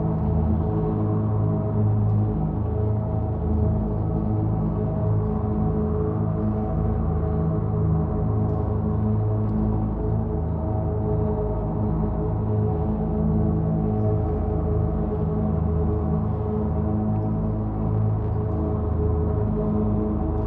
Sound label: ship habitat idle; Stellaris; shroud; ships